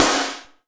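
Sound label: snare drum, Real Mega Drums; Best Service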